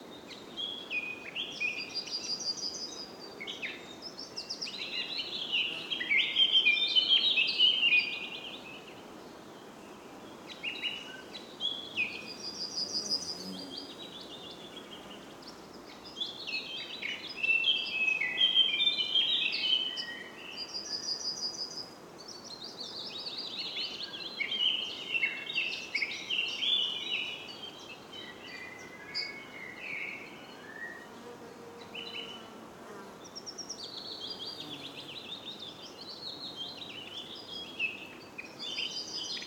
Spring Afternoon Edge of Mixed Forest Yellowhammer Eurasian Blackcap Tree Pipit Insects; Forest Ambiences; Justsoundeffects